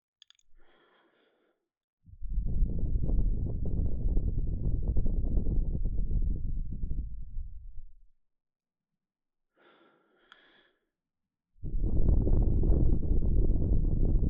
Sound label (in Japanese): 両耳同時スロー耳舐め; 声なし; ASMR女子のための寝る時に聴くスロー耳舐め